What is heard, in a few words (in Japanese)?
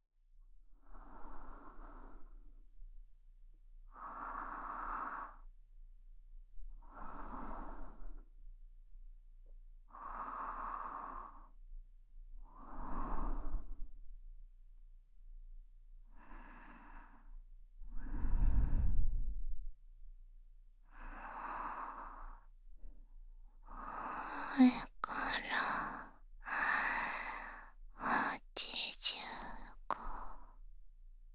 いっしょにおやすみ 寝息ASMR